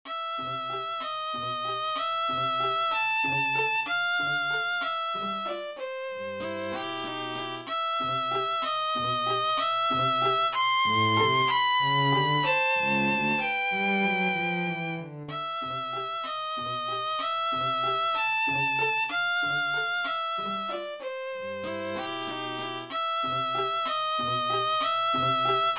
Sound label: trio for string, melodie; 10 pieces enfantines en trio